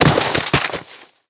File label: body fall